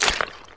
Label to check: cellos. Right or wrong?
Wrong